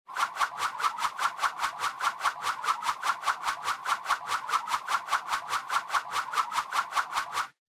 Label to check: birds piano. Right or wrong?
Wrong